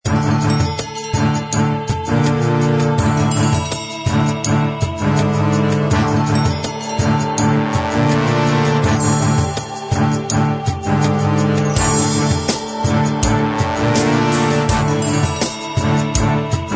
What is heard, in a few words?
music; battle for barsoom